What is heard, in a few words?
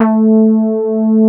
SB HORN; Wizoo - Powered Nord; STEREO LAYER